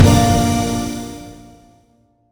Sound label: Ui Finish Perc; Toto Temple Deluxe